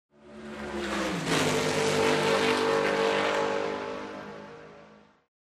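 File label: Sports Car Close Up By Fast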